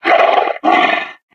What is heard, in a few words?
yeti statue growl; Source Engine; tf; lair